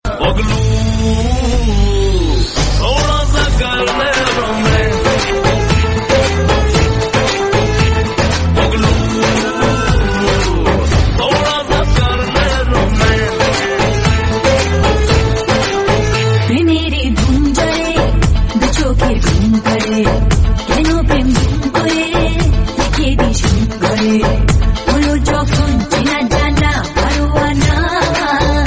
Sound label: BENGALI SONG RINGTONE